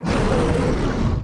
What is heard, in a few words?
Flaming Dragon Heavy Attack; Blind Quest - The Frost Demon